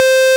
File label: MINI, Roland Synth Bass, Analog Bass; Roland - Rhythm Section